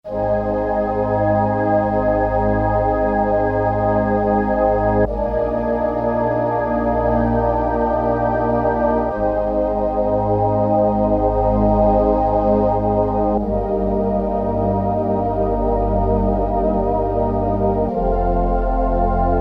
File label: Urban Pad; Roland M-DC1